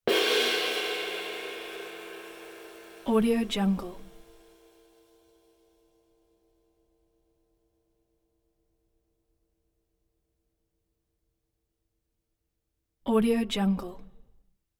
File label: cymbal 24 ride rivets mallet; meinl